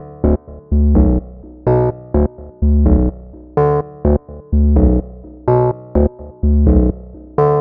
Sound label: 126 D B BASS, Funky; Loopmasters; Funky Underground Vol 2; BASS LOOPS